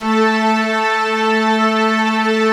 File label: ORG STRN